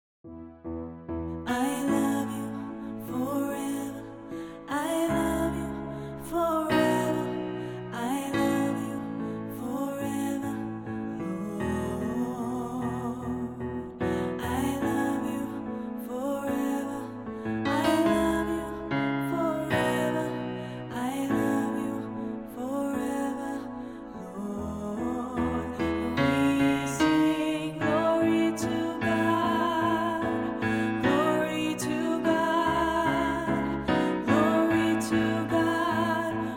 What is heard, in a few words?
Sop, vocale partijen choir; Presence op het Malieveld